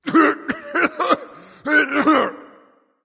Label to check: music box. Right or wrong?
Wrong